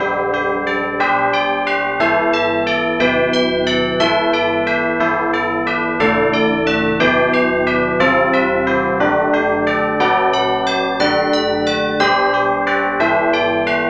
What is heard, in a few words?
tubular bells